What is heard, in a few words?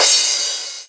Crash, Cymbals